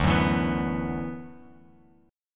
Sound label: D 6th Add 9th D, Bass Standard; D6add9 strummed; JGuitar